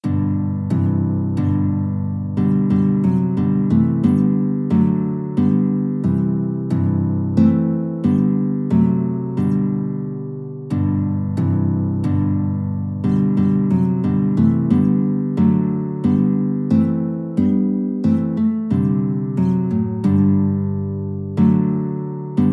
guitar

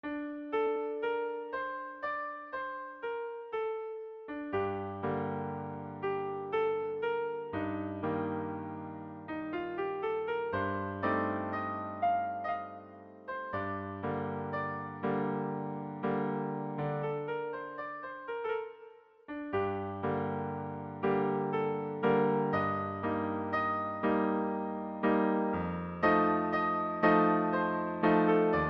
lucevan, piano

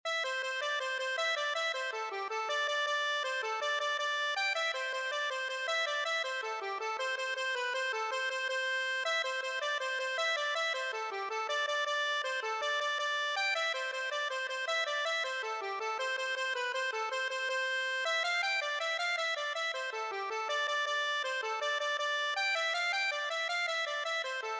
daniel sun, accordion

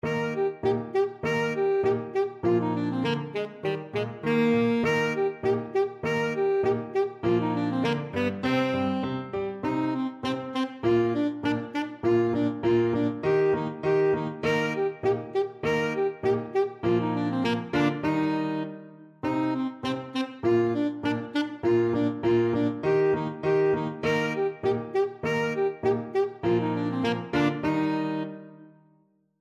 ASAX, saxophone

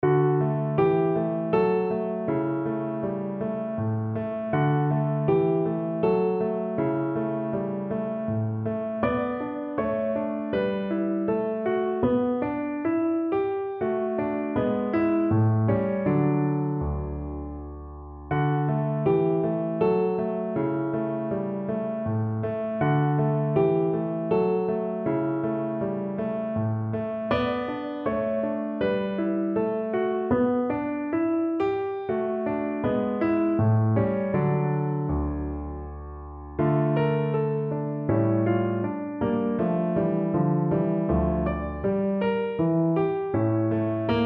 tarrega lagrima piano

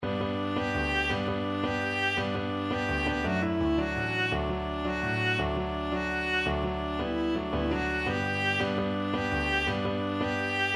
viola

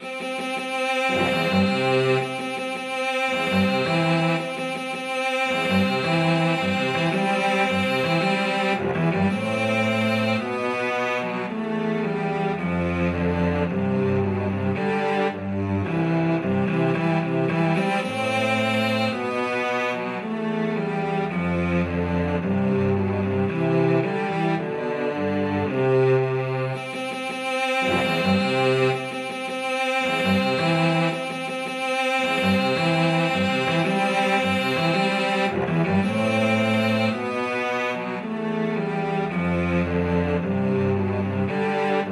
string ensemble; wedding march duet

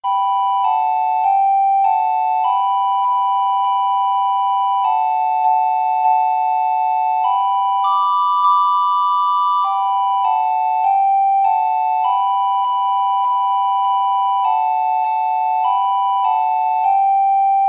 recorder duet

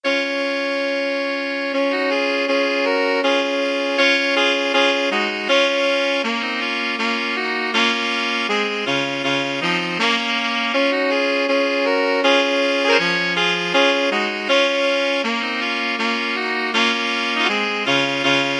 2 altos tenor sax

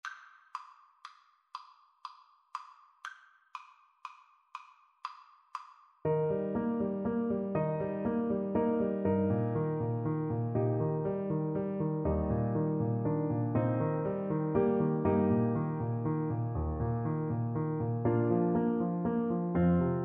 puccini beloved father, Bassoon

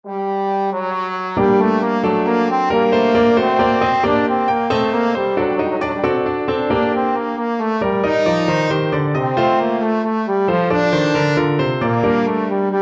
sonata, trombone

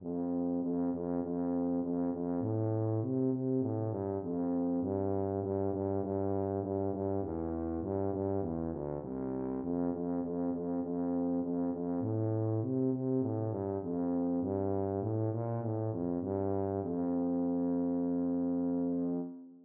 tuba

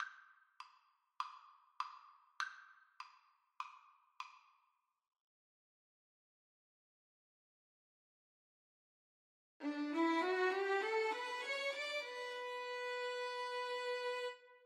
violin duet

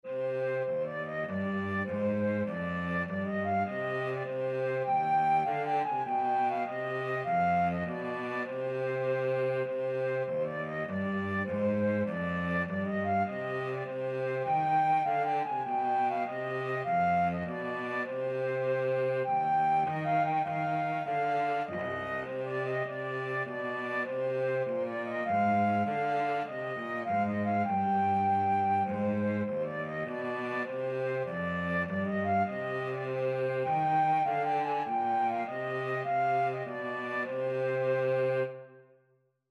deep love, flute cello

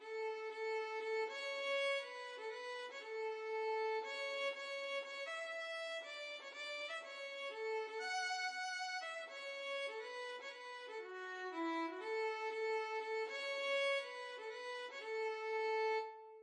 ten green bottles, violin

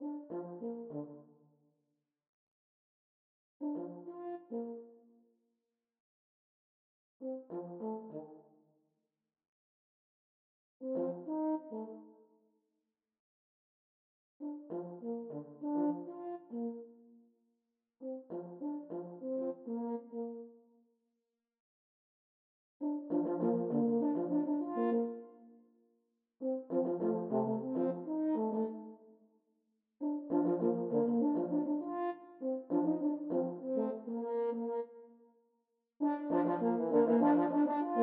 French horn duet

